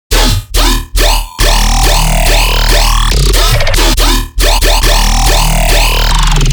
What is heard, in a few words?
Riddim Bass Loop D# 140; Bone Breaker; Ghosthack; Riddim Essentials Volume 2